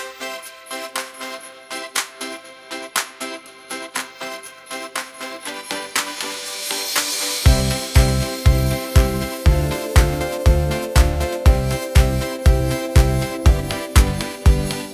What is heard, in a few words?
pop instrumental